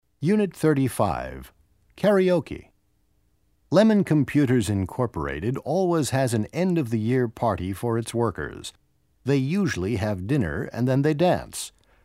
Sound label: Karaoke